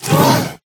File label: Spider Grunt General Scream Start Combined